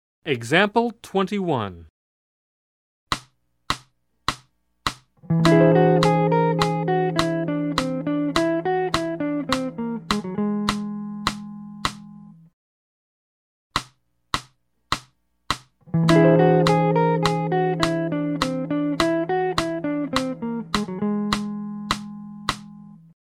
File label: Jazz